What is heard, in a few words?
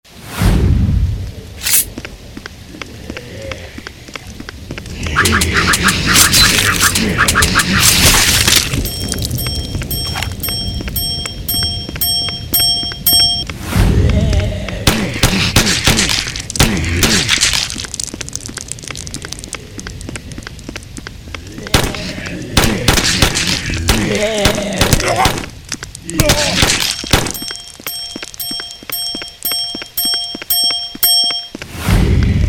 zompocalypse both weapons; Zombie Game; Liam Erven